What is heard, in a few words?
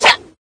jump; Cookie Run